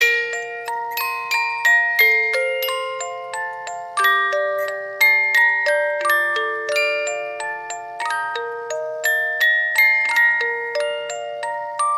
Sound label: christmas musicbox